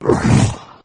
melee, flood

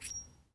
raging bull remove

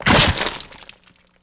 splat, explosions

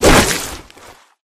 melee swish; flood

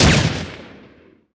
uzi fire; weapons

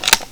bolt forward; weapons

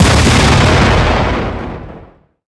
explosion

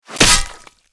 dagger flesh; weapons

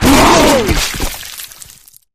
flesh destroyed large; flood

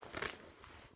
generic cloth movement, weapons handling

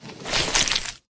human form long move; flood

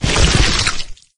carrier walk; flood

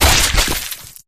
flesh destroyed medium; flood

